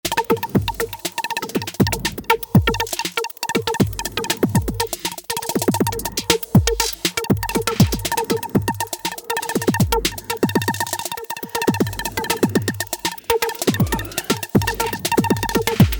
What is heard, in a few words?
drums